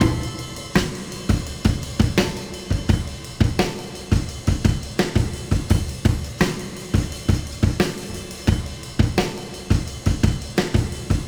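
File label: 85 bpm breakbeat d key, kick tuned to the D note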